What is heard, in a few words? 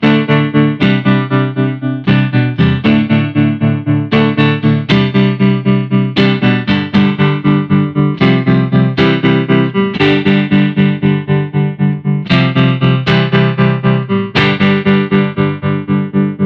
Falling Sawtooth, stereo tremolo; electro-harmonix nano pulsar